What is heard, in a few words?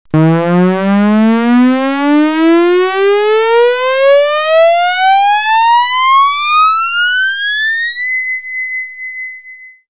air raid